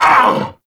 Vocal Set Pain; Warframe; Duviri; Dax

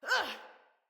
Attack Female; Battle; Envoy

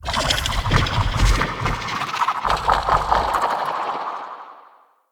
Corrupted Slug Sub Boss Vocal Idle; Creatures

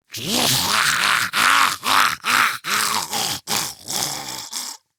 Attack Grineer Ghoul; Grineer Ghoul Expired; Battle Barks

